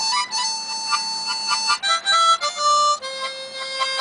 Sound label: Harmonica